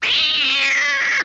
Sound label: angry cat